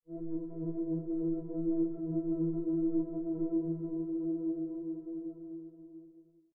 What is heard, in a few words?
pads